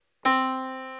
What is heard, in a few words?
Yamaha Disk, piano sound